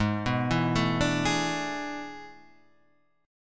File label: E 11th Ab